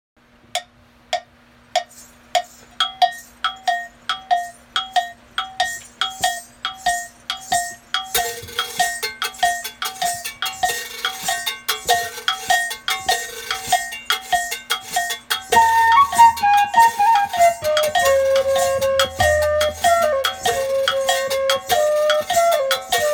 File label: Bells Bamboo